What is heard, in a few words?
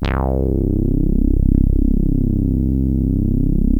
ODY, ARP Odyssey